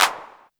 CLAP 909, TR909

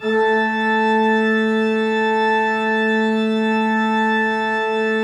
PIPE, 3DOrgans; E-MU Producer Series Vol. 5 – 3-D Audio Collection; 3D Pipes Dr Joyce

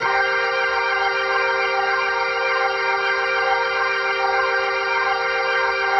Progression, Organ, Loops

